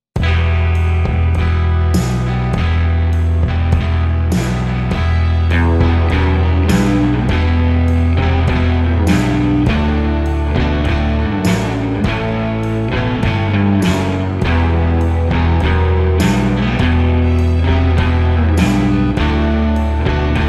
western

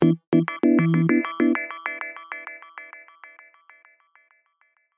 midtempo funk, micromax

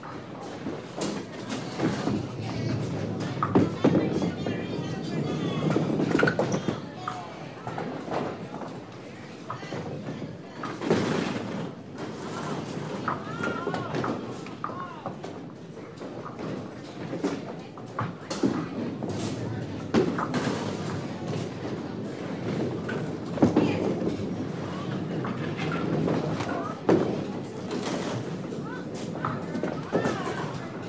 bowling

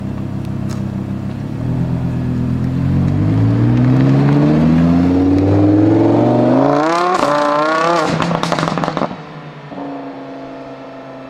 sportcar

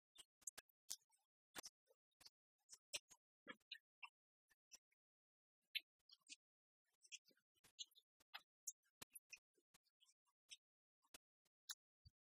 pop

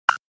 undock

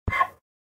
hit

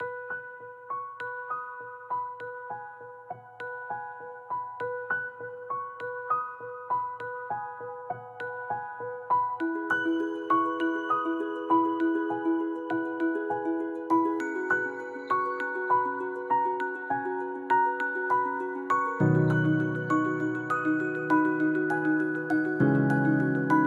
dewdrops, zvuki telefona black shark

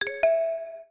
gallop

